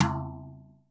BRSH; R70 KIT; Crypton - DrumBase5000; R70 KIT #1

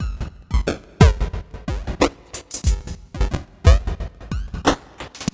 Gated Beat (90BPM); musicradar

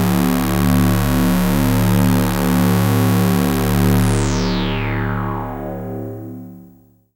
Lucky low D; Keith Emerson Signature Series

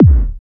Kick, Trance; Sonic Foundry (Sony Creative Software); Trance NRG VOL-2; Drums

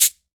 CABASA, Latin Hand Perc

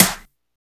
NEW SNARE; BONUS SN2

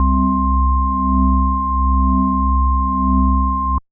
Church Organ C3, Old Skool House

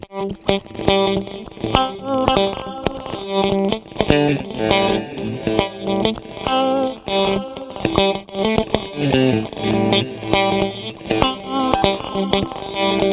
PINGPONG, Funk Guitar; 90 s Sample CDs; Zero G

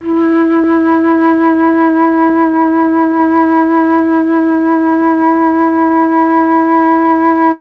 flute; MEL.FLUTE

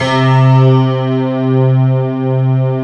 piano pad C3

